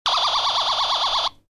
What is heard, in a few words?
Toy, Small: Ray Gun Effect; Toy Gun Sound Effects; Toy Guns (Sound Effects:Toys); Stuart Duffield